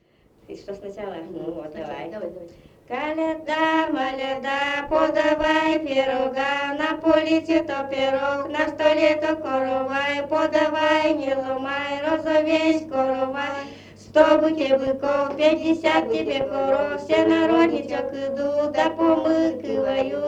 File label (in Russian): Коляда, моляда, подавай пирога